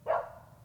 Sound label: dog; AssaultCube; Alien Stars; American Muscle Series